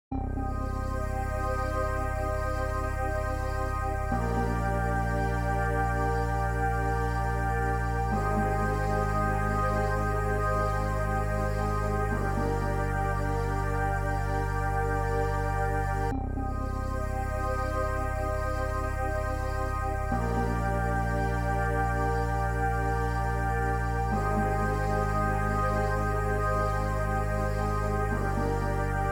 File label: Pad Static